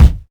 Kick Garage

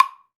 Metronome, Wood; Ableton Live 12 Lite; Core Library; Metronomes

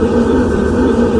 hoverbug yaw; There; ThereClient

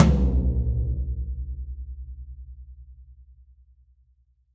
Tom Stick Hit; One Shots; Drums

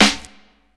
Snare Edged, drums

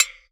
Wood Bell Acoustified, One Shots Drums

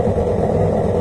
brake; There; ThereClient; bacio

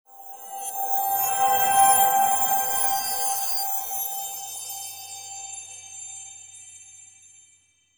Symbols Fly From Gravestones; Journey

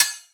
Hihat Open Rattler

